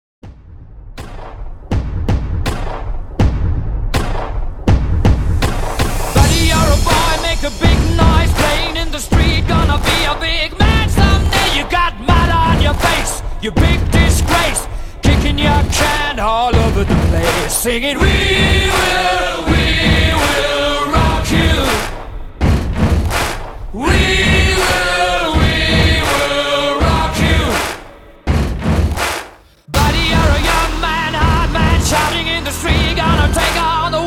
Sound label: TRANSITION 81 123